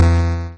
release flinger

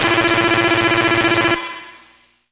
zap

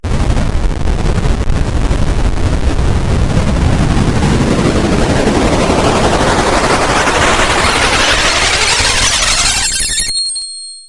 angry up

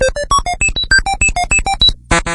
cute

